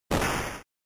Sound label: NES Shooter Damage